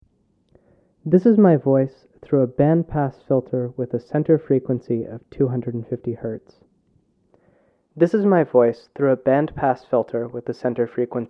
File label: bandpass